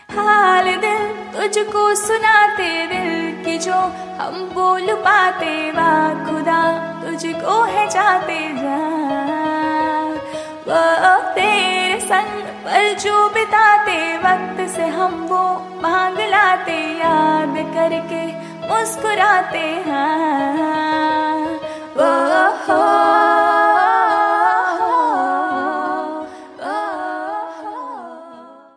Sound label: Hindi Female Song Ringtone